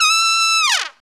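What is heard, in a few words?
Trumpet Fall, Roland; Club-50 - Foundations Roland; Trumpets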